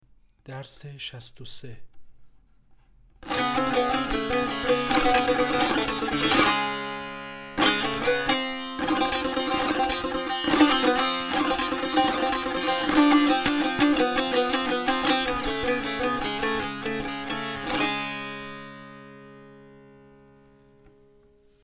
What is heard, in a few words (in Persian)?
مشق تنبور